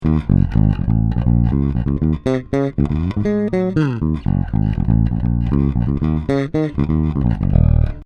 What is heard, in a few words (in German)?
both PU passiv